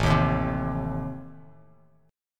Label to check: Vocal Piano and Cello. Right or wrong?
Wrong